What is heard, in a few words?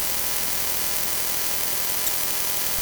electric static